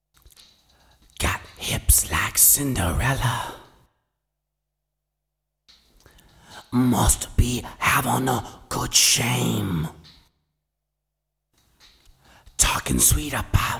vocals

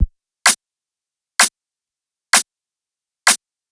128 CLAP LOOP, House; D&M - House World Construction vol 2

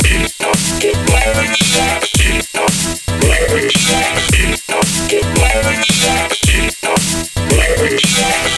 112, Discostep Disco Loops; Mystery3

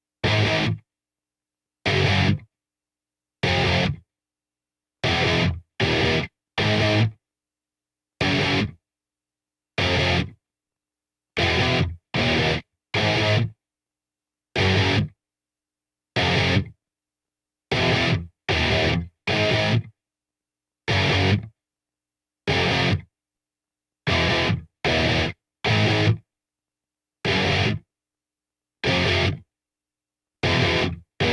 guitar

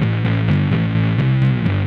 bass loop

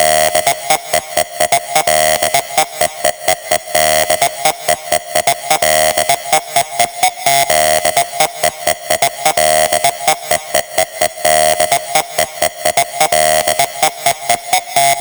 128BPM Lead Loops - D#; MELBOURNE DROP VOL. 4

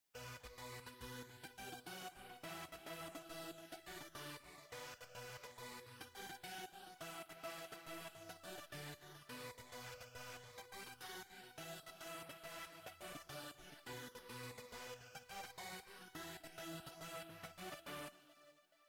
Synth Chor; Remix Sample Pack

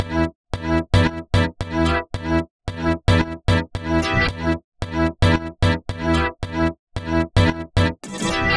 112, Discostep Disco Loops; Mystery2

